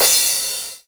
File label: 909 CRASH; Techno Trance; E-MU Formula 4000 Series Vol. 2